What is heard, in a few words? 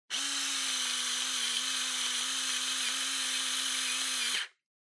Spice Mill Pepper